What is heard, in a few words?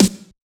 Snare; FLPRO